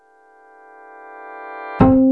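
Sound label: rev comb, piano; AKAI S-Series CD-ROM Sound Library VOL-2; PF MIX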